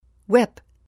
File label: whip